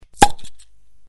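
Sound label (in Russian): попкорн одиночный шумный близкий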